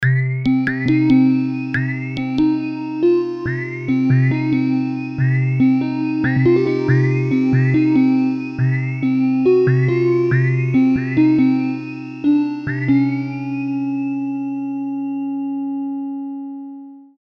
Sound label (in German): Keys gemischt